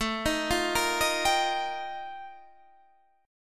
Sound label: F 11th A, chord